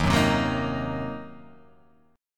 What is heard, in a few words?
Eb Minor 6th, chord; strummed